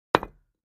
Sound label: Patio Block Hit Sledg, concrete & brick